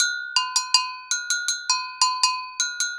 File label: agogo 80 bpm, latin